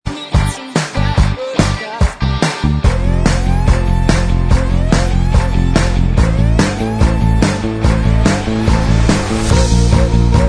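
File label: backing vocals, backing track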